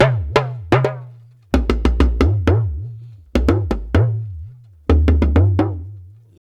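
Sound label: 120 bpm, Ju-Ju Talking Drum Solo; Spectrasonics; Supreme Beats; African Contemporary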